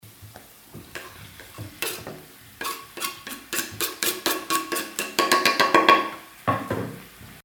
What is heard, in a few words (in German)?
Vom Brettchen in die Pfanne